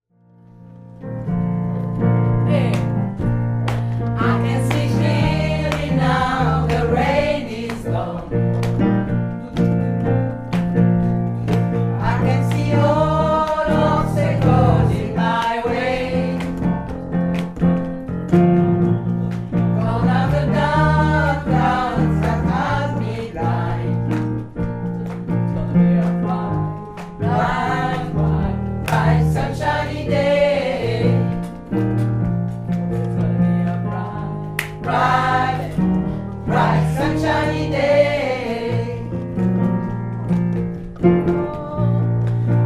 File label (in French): couplets mezzo, gospel; couplet 1